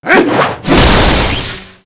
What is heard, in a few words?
Novice Skill